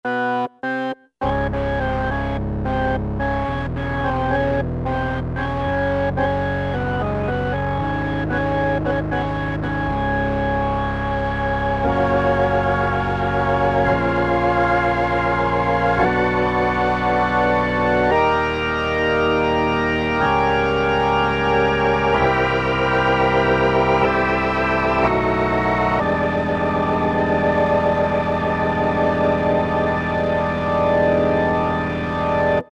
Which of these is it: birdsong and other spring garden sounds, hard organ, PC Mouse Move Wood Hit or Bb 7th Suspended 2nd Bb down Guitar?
hard organ